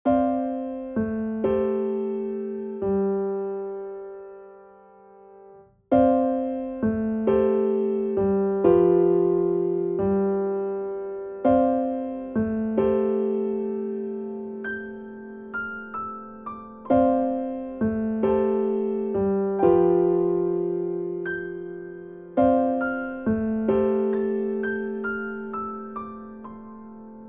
Piano Play

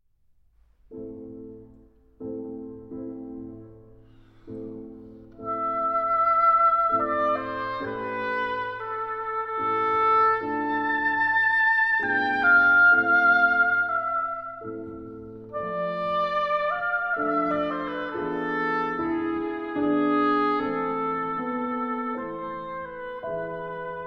for Oboe; Die Zauberflöte, K. 620; Ach, ich fühl's, es ist verschwunden (Arr. Spindler for Oboe and Piano)

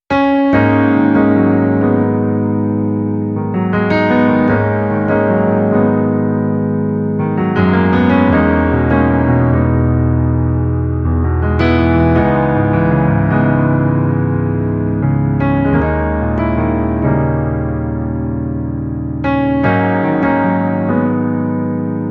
Blues, Piano

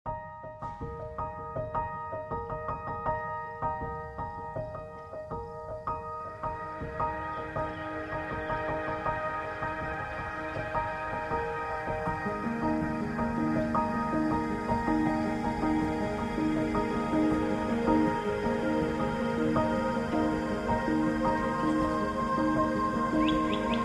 Strings

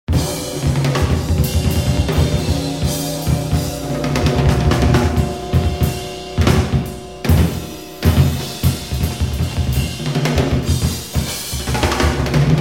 The Fire Drum

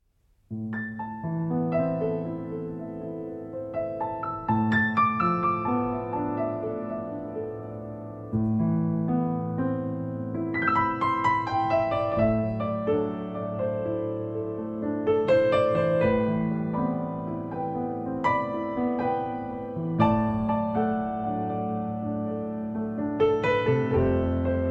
Arr. for Piano

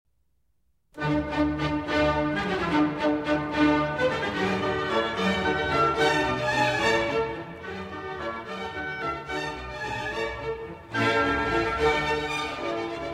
Symphony No. 4 in D Major, Presto; Mozart Symphony No. 4 in D Major, K. 19 - III. Presto

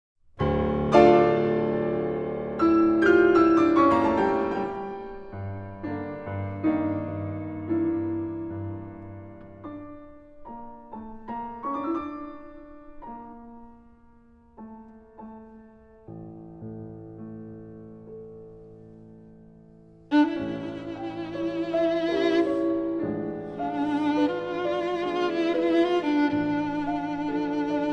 Violin and Piano; The Crane; Arr. S.Z. Aslamazian For

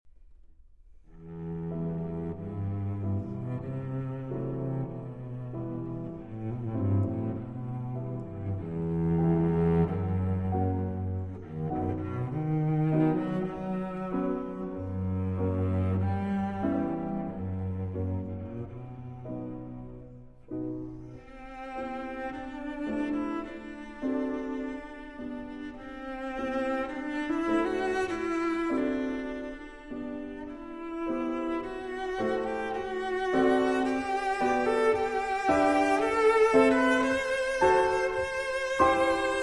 Cello Sonata in E Minor, Allegro non troppo

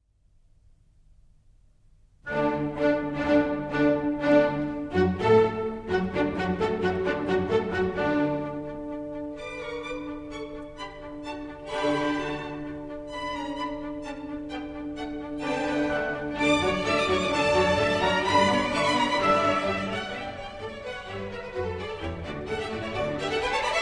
Violin Concerto in D Major, Allegro

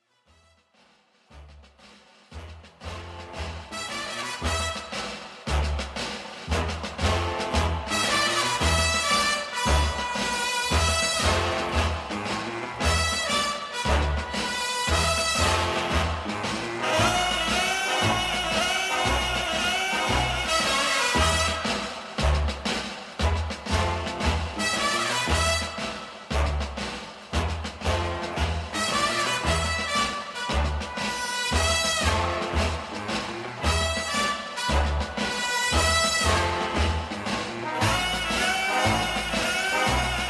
March; Boubon Street Beat

